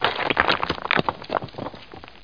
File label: rockfall, ambience; Hexen 2